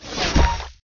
Mage blue rain drop

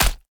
tower demon Pit big Guy basic Attack

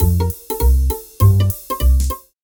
frog dance

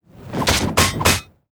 buffed Spin Attack 3 hits; tenshi

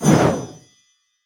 gnome shot chime

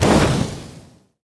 warlock ranged impact

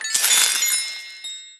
hand of midas

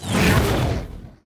rage outburst cast; heroes; kratoa

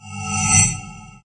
soul burn; veznan; sinimpacto

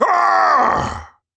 Reinforcement Death

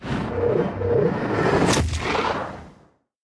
dragons enemy shadow basic evolution; sfx

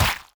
enemy void Blinker death

